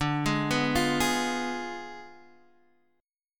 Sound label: D Diminished 7th, chord